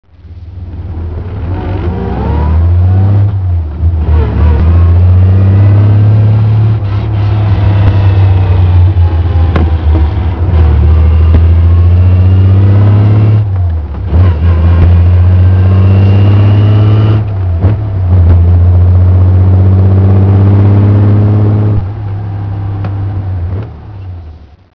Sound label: alvis drive